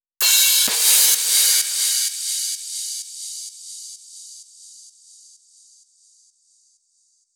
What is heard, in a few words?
Crash Mix, 128 BPM; Vengeance Samples; Vocal-Hook Kits; Song Kit